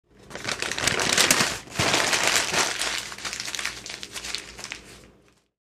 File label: paper crumbling